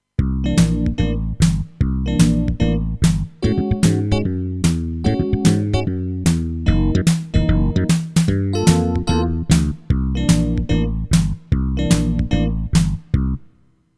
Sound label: Version E Blues